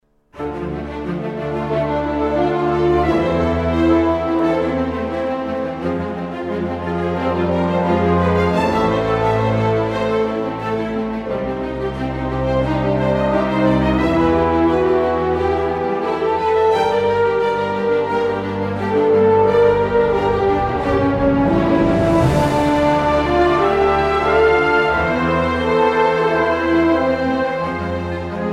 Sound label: Hallways No Organ; Waycrest Manor; Battle for Azeroth